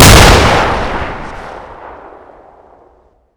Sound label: Inf Weapons; Red Orchestra 41-45